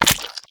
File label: HitByShotgun; We Are The Dwarves; SwampJumper